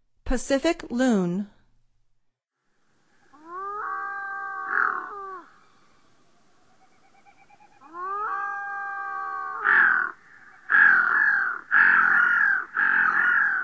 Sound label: Pacific Loon; North America; Stokes